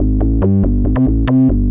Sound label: TECHNO BASS 140 A